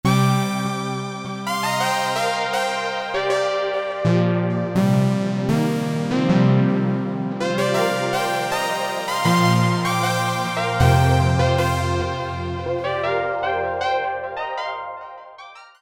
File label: Roland JP-8000, Ancient Asia; Factory Patches